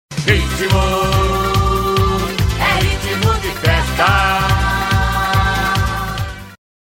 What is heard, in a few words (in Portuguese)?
ritmo de festa